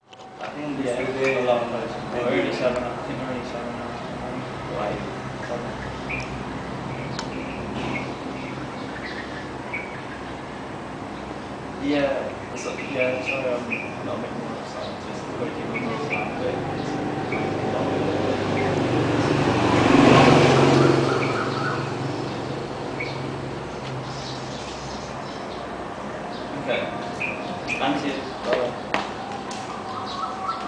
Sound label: sound of sunset scene from love lane